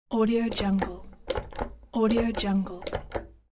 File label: liquid hand soap dispenser